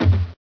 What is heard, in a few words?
g bounce, weapons; source engine; hl1